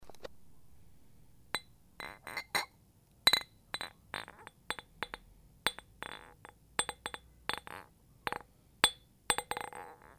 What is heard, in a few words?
One Blown Glass Touching a Commercially Manufactured Glass